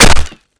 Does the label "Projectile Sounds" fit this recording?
Yes